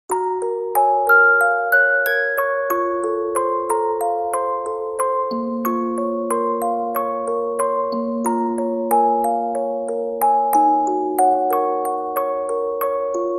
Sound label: Music Box